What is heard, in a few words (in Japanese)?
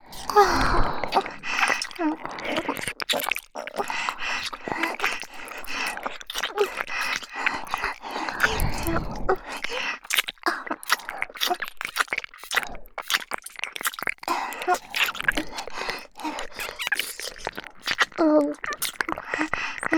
左右同時耳舐め × Dキス Hard, ASMR